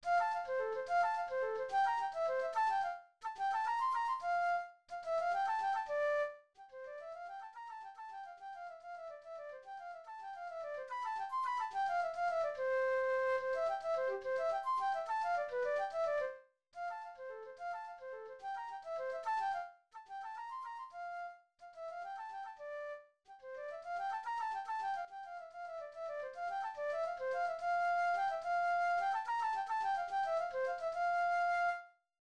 Flute

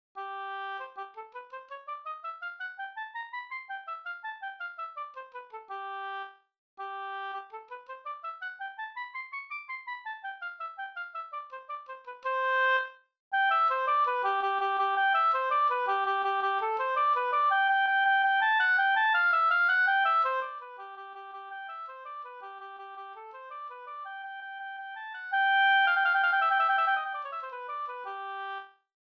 Oboe

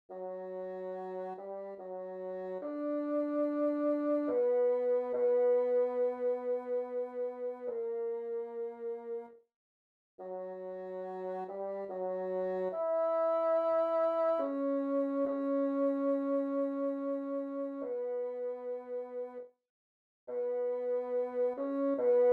Bassoon

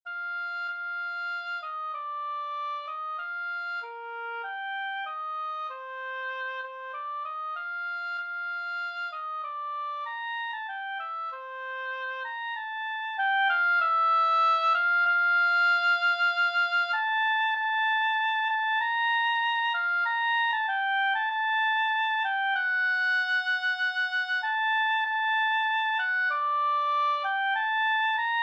Oboe

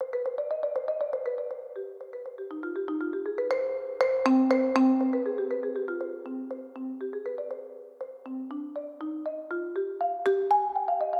Marimba

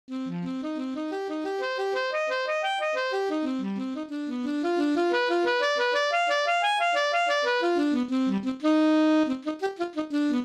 Alto Saxophone

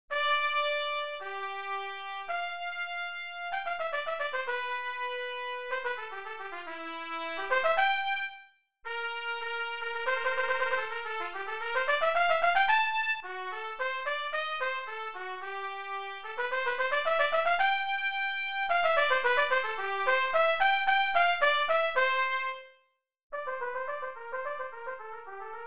Trumpet

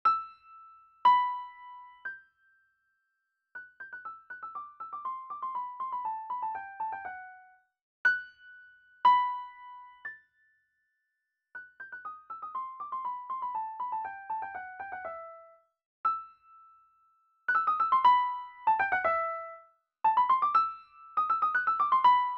Baritone T.C.

